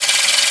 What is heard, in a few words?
damage